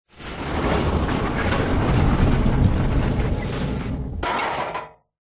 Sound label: emergency prison cell door metal slide close rattle dry; zapsplat